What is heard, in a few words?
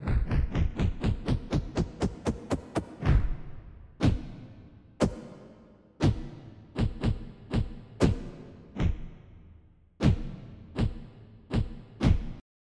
punch; Populous Demo